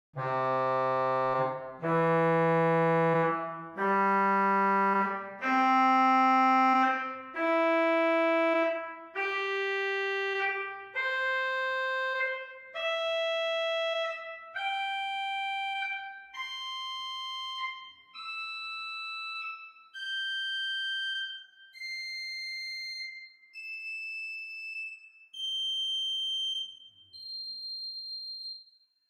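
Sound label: Great Clarion 4 arpeggio; Hauptwerk; St Annes Moseley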